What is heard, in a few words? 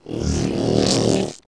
zerg drone; 星际争霸音效; 虫族